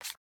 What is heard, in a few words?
mosaic touchdown; LG Leon LTE